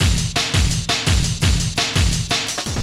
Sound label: Dirty Stomp